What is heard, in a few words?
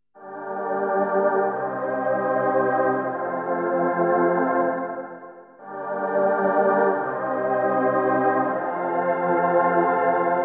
Tron Choir